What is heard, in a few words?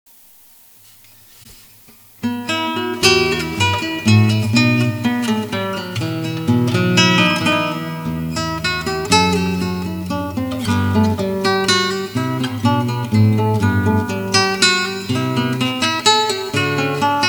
Courante, trio; Weiss